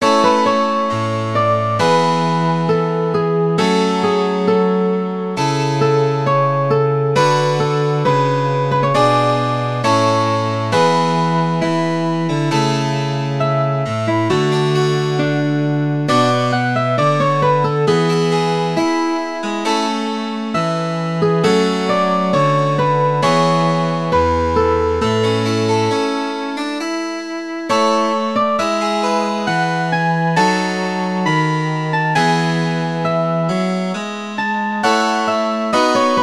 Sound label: Adagio partitura cello, mandolin; LA MAGGIORE